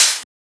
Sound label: Open Hat; FLPRO